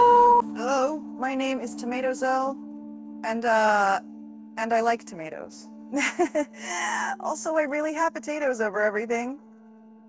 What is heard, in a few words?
bark